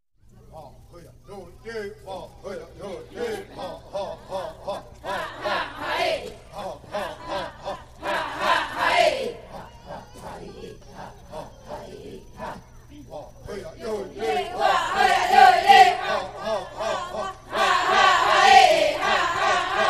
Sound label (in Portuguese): Canto final da corrida de mulheres